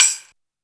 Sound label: TAMBRIN, TAMBOURIN; Big Beat Samples & More; AKAI